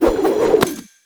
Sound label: Speargun Thrown Reload Whoosh; Warframe; Weapons